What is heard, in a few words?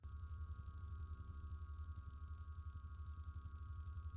Light Beam Idle Hum